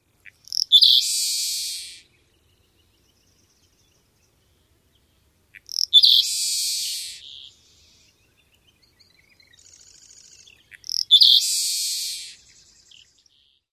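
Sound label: seaside sparrow songs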